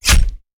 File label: Stab Bayonnet; Sound Ideas; Guns